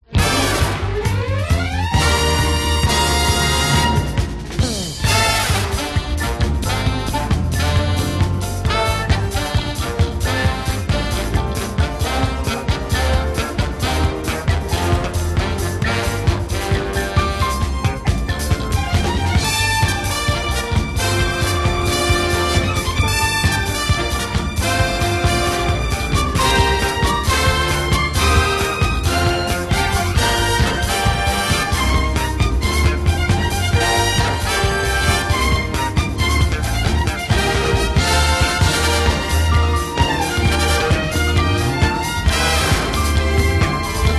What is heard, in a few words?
Roller Disco